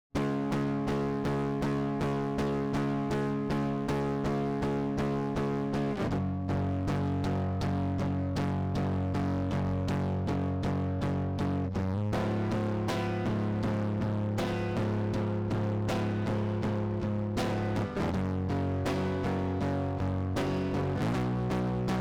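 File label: Distorted Guitar Solo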